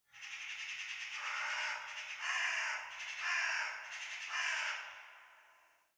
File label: ambient winter birds